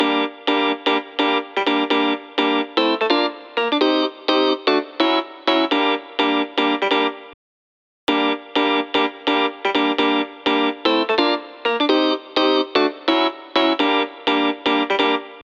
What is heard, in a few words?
Organ Side Low Shelf